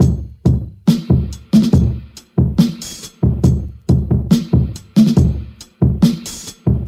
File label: Drum loops, Boombap